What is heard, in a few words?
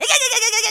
Burn; Link getting burned (3rd sound)